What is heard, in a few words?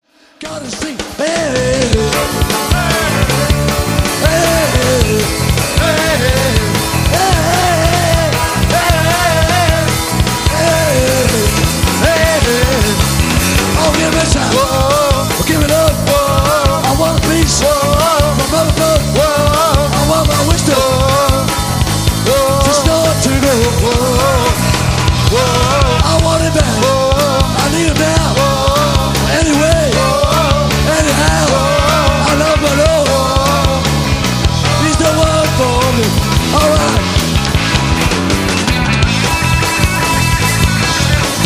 Gospel Song Venue